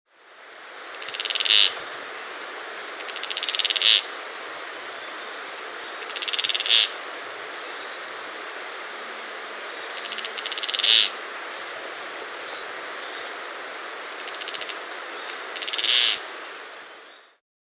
Chestnut-Crowned Cotinga (Ampelion Rufaxilla), Andean Birds; S. America